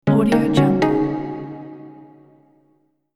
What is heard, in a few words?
asian melodic notification